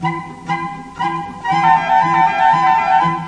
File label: flooty loop; SAMPLED MUSIC